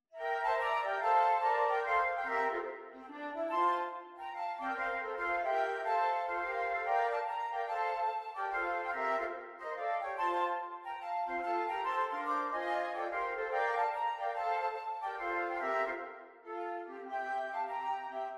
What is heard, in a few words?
flute